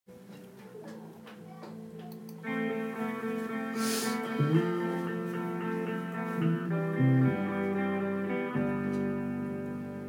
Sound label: real drum kit recording